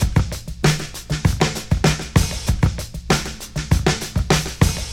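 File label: 98 bpm high quality breakbeat sample e key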